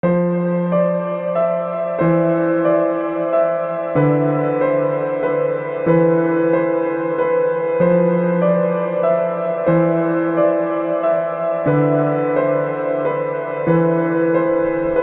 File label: piano